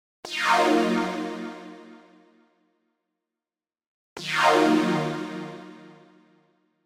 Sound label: Pad; PatchFactory